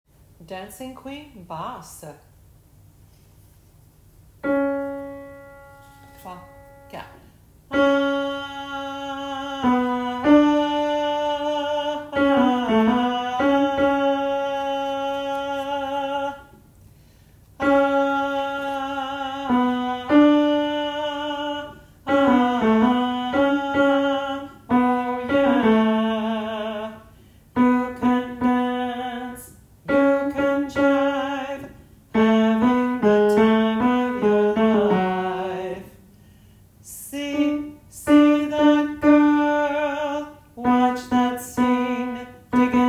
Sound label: basses; cabaret 2025 chorale